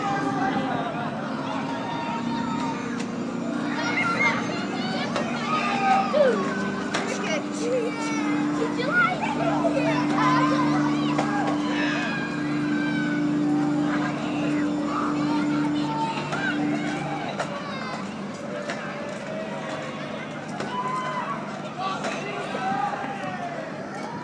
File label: horror swings